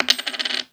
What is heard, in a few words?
coins table